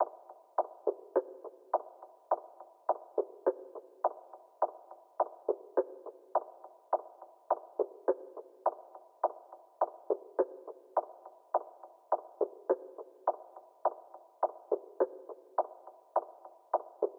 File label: Drum Loop 104 BPM Percussion, RnB; Affection; Cymatics; ANALOG EVOLUTION Beta